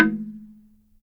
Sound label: HRD SLIT DR, Heart of Africa; SLIT DRM2